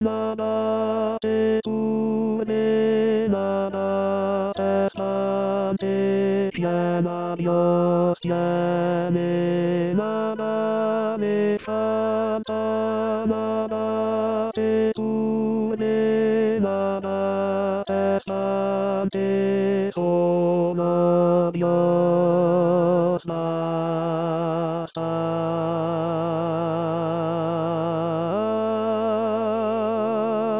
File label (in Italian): Tenori